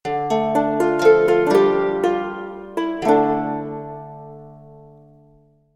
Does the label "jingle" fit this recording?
Yes